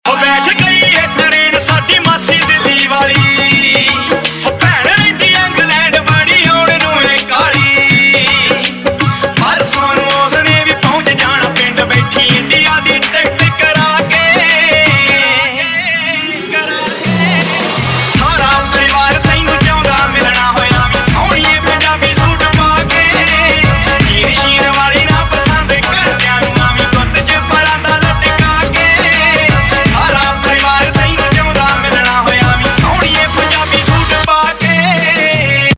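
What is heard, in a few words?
Ringtone, Punjabi Pop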